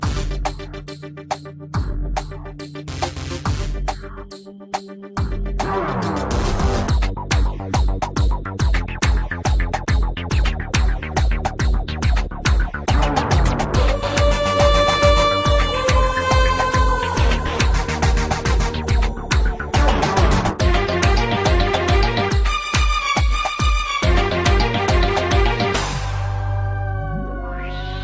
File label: Hindi Remix